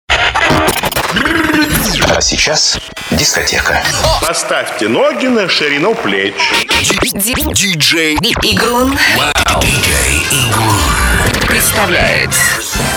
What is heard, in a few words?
JINGLES